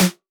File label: House Snare - F#; Drums; One Shots; Snares